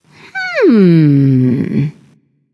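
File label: thinking, female; smite; voicepack; hera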